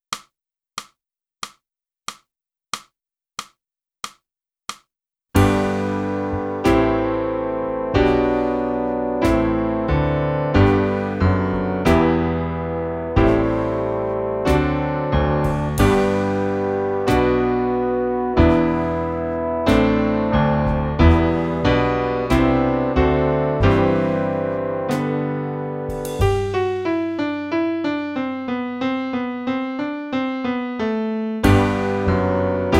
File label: flute; boosey